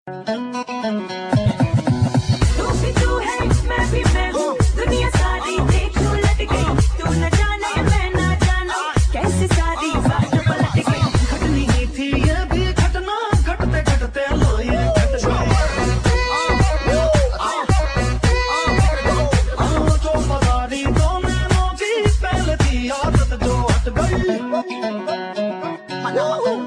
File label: Remix